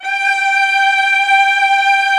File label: ARCO CELLI; OMI - Universe of Sounds